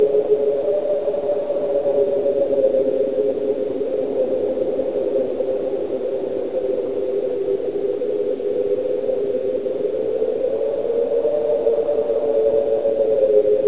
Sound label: wind loop; breakout; breeze